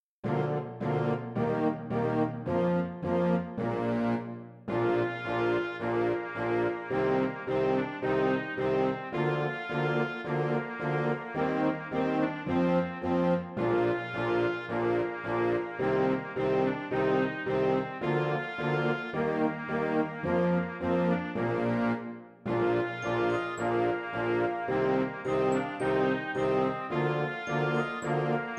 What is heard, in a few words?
a ceremonial march